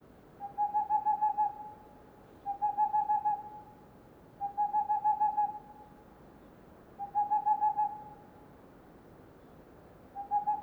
Tengmalms Owl Hooting of male

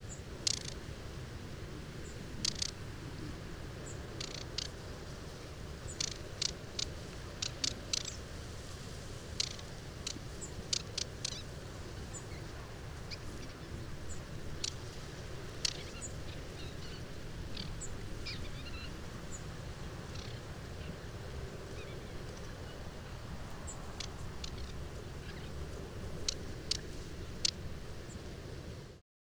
Subsong in reaction to distant playback

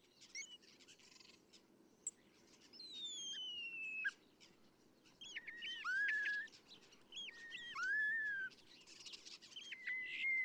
Song of an adult

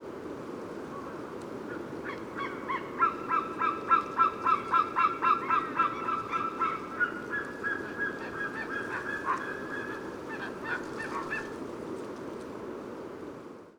pair

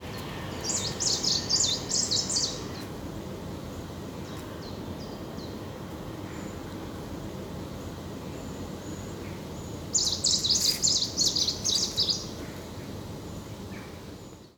song, vlaardingen

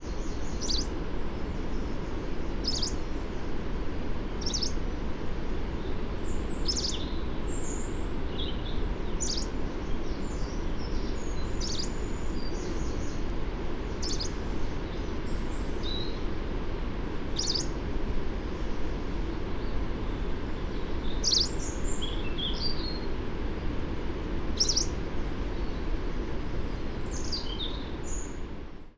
nitidus call

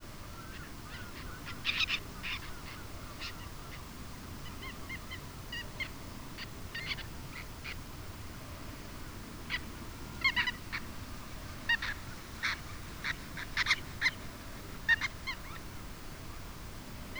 chatter

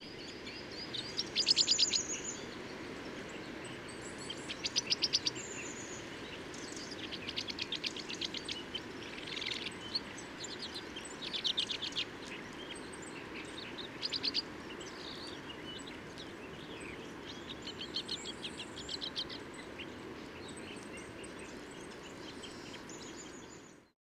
Song of an adult male

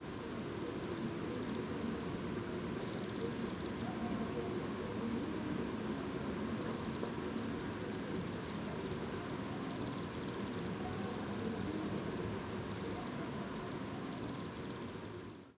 zzz

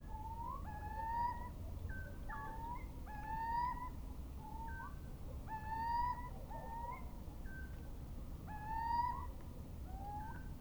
Cucumiau Hooting of several males

